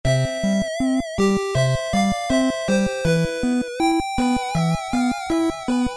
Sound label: tada; Boulder Attack 2; Puro